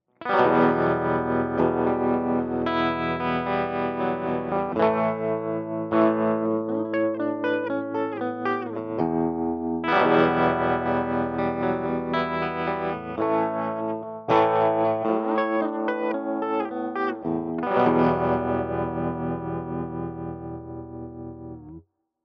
Jukebox 30 Normal Low Tremolo; Audified ampLion 2

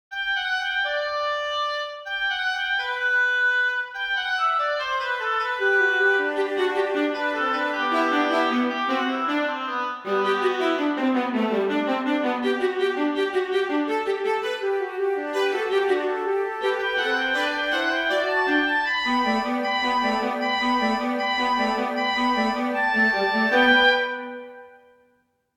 Prelude Fugue In G

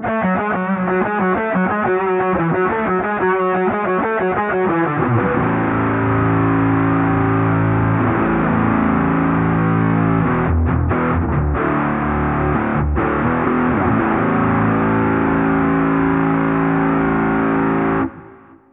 Pitch Shifter Mode Octave Down Mix 100

